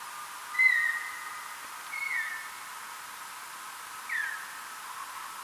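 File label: Eptesicus serotinus, bat; Boehm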